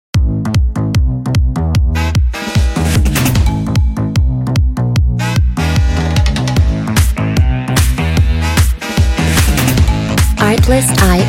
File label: Sped Up